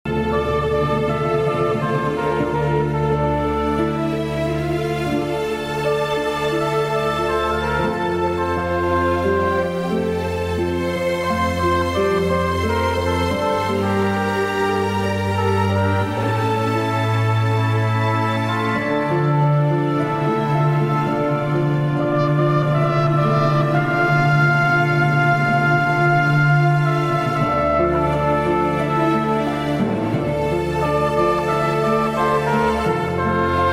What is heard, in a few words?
Cornet; کورنت